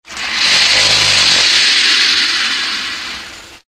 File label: crocs hiss; Source Engine; tf; lair